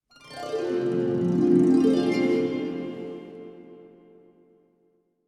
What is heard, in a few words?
harp gliss